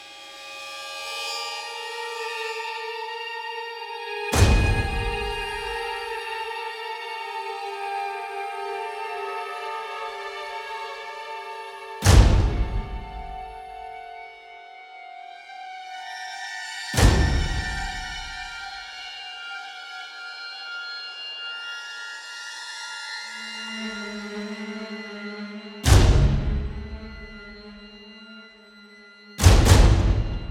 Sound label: Suspense, Loop, 81bpm, Classic Horror Sci-Fi Music Pack; Dead Ark